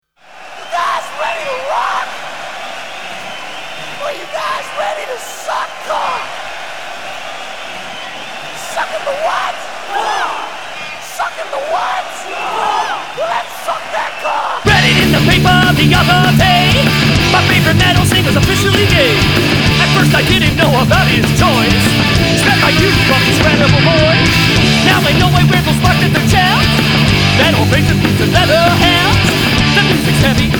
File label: Gay Heavy Metal Singer